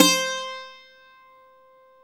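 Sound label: BZ F C, bouzouki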